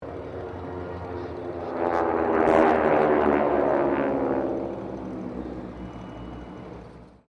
AIRCRAFT UNKNOWN PROPELLAR SQUADRAN PASS; Airplanes; Vehicles

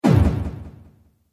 Excited Impact Fast Echoes; Miscellaneous Impact Sound Effects

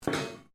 METAL CRASH SMALL; Metal Sound Effects; Metal (Sound Effects:Materials)

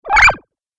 Cartoon Magic Spell

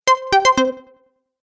Stage Level Complete; Video Game Sound Effects

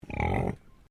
Animal Pig Landrace Grunt

